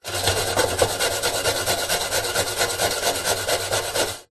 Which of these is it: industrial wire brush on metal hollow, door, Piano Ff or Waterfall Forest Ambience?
industrial wire brush on metal hollow